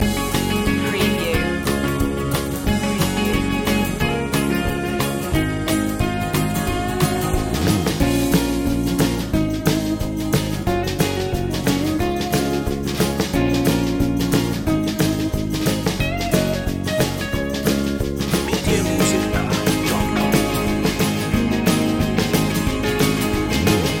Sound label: back home loop